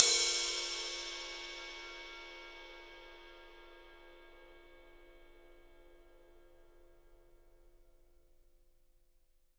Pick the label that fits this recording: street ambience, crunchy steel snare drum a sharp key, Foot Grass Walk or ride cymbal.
ride cymbal